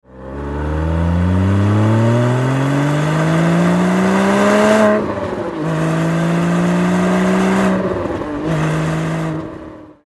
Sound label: Cayman